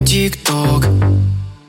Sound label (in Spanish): notificacion tik tok